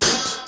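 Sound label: DRUM HIT; Best Service Advanced Media Trax Building Blocks; FAT ROCKA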